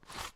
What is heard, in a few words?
Voodoo Pin; Phasmophobia